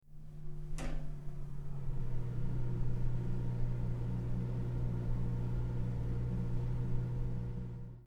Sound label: elevator hum